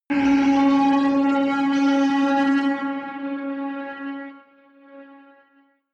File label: synth chirp, drones